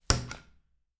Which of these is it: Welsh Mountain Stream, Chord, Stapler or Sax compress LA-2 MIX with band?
Stapler